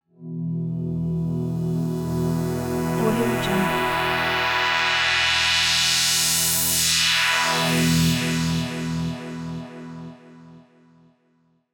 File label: atmo pad eighties fifth long; smartsoundfx